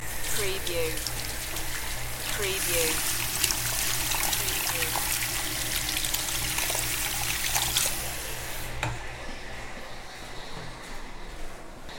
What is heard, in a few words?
Washing Hands